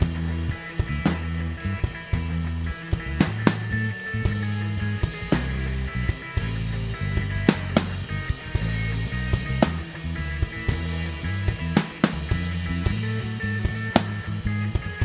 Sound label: drums